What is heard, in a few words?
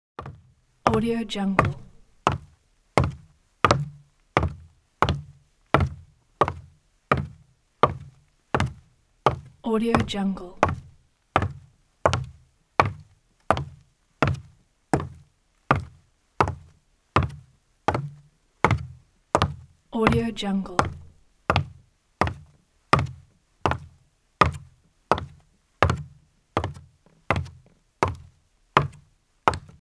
male footsteps on wood